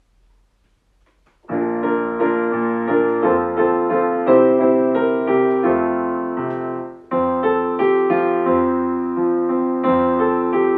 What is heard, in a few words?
Jingle Bells Slower